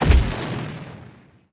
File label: Explosion; knight rider